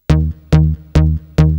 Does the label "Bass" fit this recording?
Yes